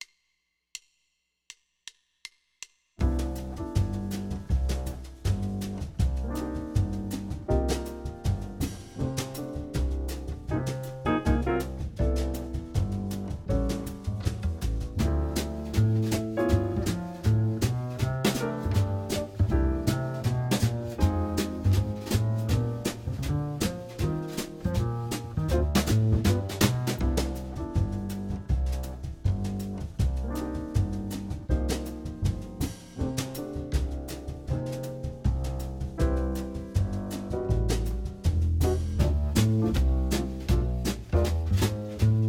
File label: Jazz Etude; Etude #4 track only